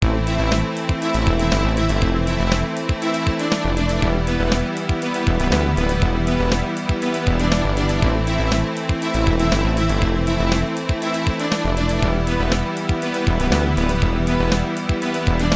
Failed; Edge Rally 2

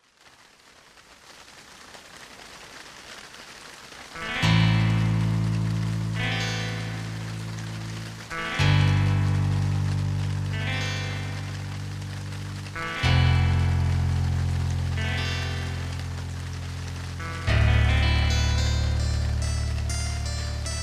Raining Electro

